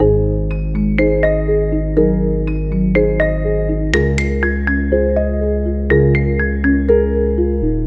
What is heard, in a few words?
G#maj 122BPM, Music Loops; 99Sounds; Monosounds; PANDORA BOX!; Beach Season